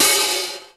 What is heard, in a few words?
OP HH, COMPU DRUMS; Crypton - DrumBase5000